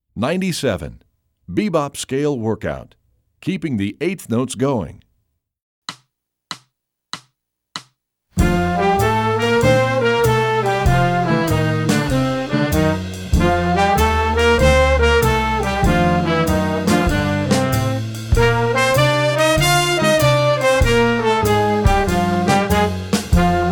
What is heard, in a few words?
bebop scale workout